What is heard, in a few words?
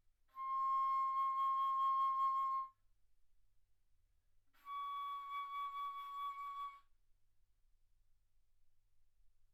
Bass Flute ff C6 Db6